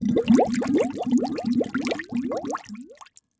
Liquid Water Bubbling In Metal Bowl Heavy To Light